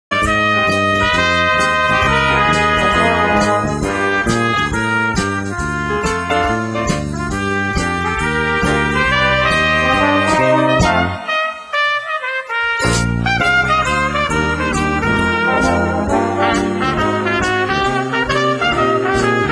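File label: trumpet solo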